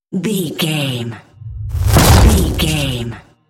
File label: dramatic whoosh hit trailer